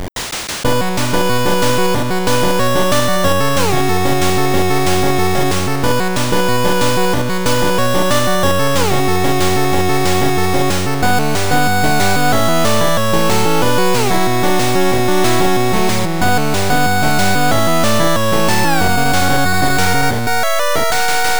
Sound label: 8bit Robot Battle